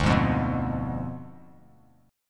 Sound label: D Diminished 7th D down Bass Standard; strummed